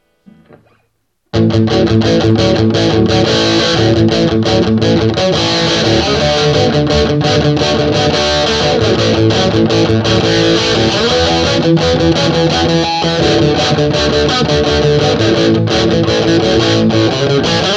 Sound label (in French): dimarzio disto chevalet; guitare